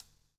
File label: COPS STK; Spectrasonics - Backbeat